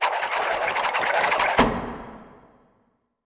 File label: shuffle